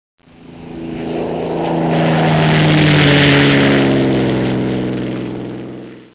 plane; DR BRAIN; BUGS

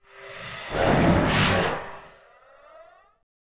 cast; poolofradiance

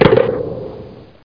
tennis

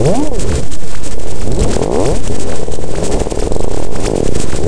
Chaos Ship

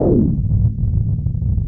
swish

